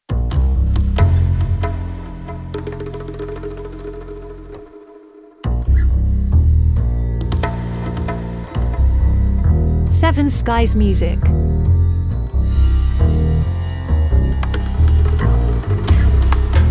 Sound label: Ambient Suspense